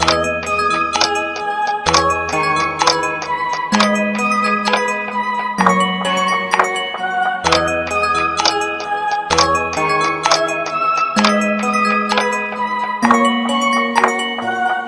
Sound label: Original Sample 129 BPM